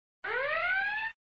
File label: Sound SFX, flipper